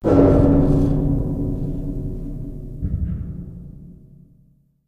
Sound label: cable rattle; random amb sfx